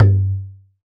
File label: Tabla Baya Ge; Roland BK-5; Percussion